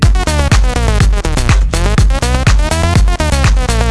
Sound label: song